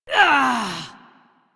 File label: Injured Grunt; Warframe; Tutorial; Injured Ostrons